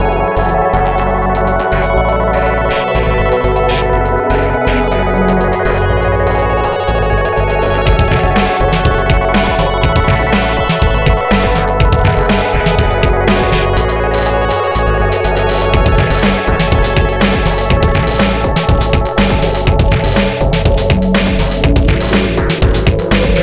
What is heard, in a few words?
music bar; freelancer-hd-edition